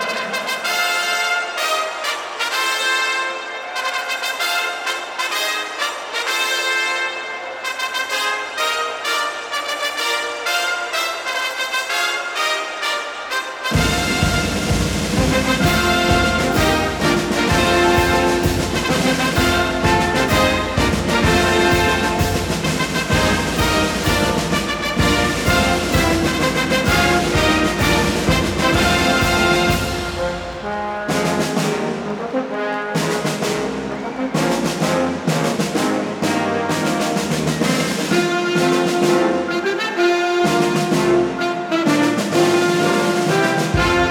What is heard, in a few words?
Fanfare intro; Groots met een zachte G 2013